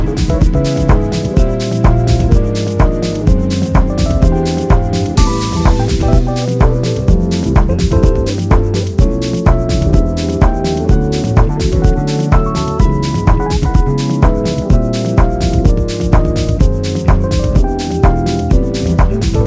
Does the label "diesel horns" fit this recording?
No